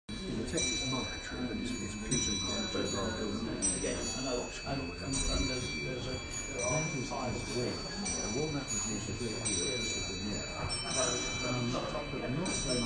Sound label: clock chimes